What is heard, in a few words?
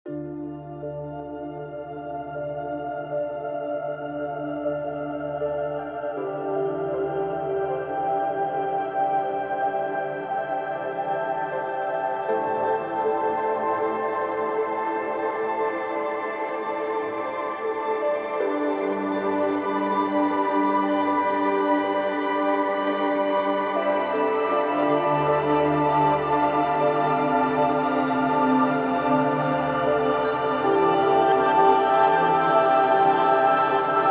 Galaxy Harp; Kompose Audio - Galactic Voyager Series: Arcturus Nova; Kontakt